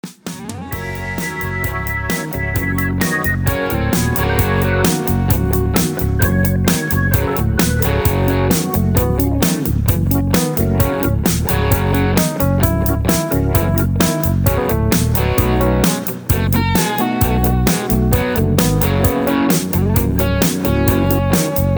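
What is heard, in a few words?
with melody no background vocals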